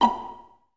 Violas A#5 Pizz; Miroslav Vitous; String Ensembles

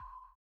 SONAR R; Spectrasonics; Metamorphosis

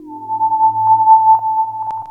Spectral Sonar; Sonic Foundry (Sony Creative Software); Loop Noir; Session 12 - Otherworld Journey

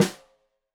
Snare Stereo, Drums; Deeply Sampled Drums

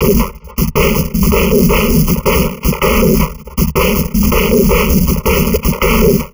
160, Drum Loops Crazy Processed; SONMOD; USB Soundscan vol.07; AKAI

